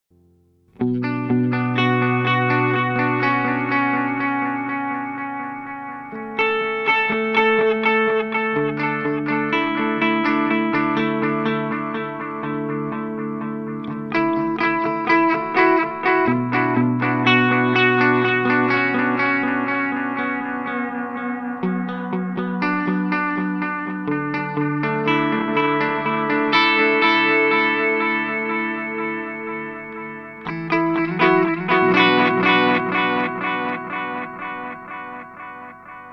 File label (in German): Tube Tape Echo natuerliches Bandeiern; Fulltone TTE